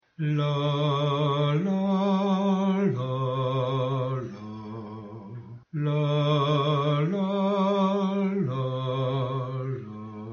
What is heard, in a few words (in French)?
basse; etoile a brille